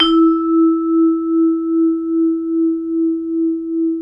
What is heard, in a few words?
VIBRAPHON; ILIO